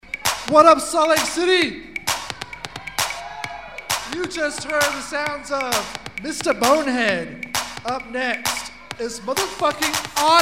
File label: Electro; Electro Thursday